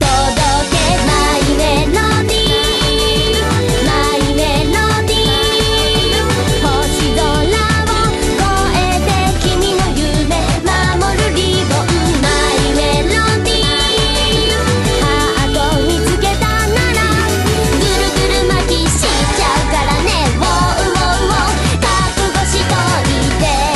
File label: melody, ringtones